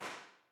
Sanken CU41, impulse responses; Henry Olonga; The Beautiful Room

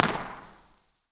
Cranbrook Art Museum, Impulse Response; EchoThief Impulse Response Library

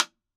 Iron speaker; Speakers and telephones; Siemens Iron speaker

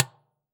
Dustbin, IR; Claustrofobia v1.1; Dustbin 2

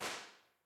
Gefel M930, impulse responses; Henry Olonga; The Beautiful Room

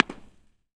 Biomedical Sciences, Impulse Response, Brutalism; EchoThief Impulse Response Library

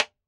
Erres tube radio, IR, Speakers and telephones

